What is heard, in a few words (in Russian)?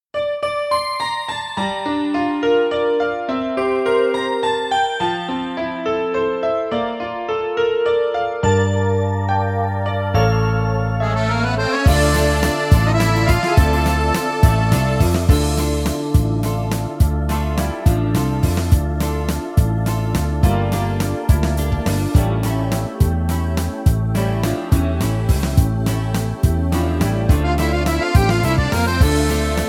vals minus